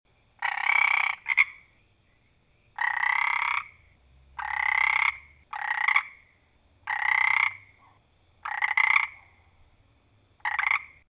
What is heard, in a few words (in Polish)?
zaba